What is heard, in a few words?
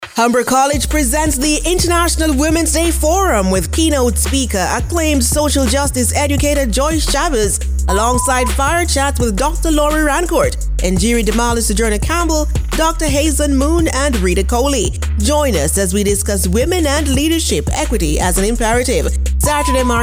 TONE